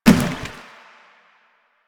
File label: Janitor Cart Impact Short; Warframe; Ambience; Nineteen Ninety Nine